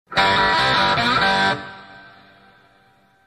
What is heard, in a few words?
opening riff; We think this plays when you roll up a skeleton!